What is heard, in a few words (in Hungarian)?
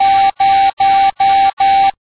Távíró 0